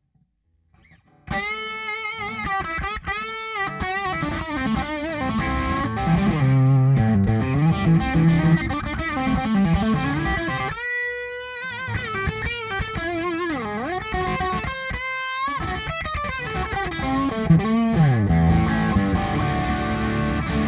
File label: Bridge Pup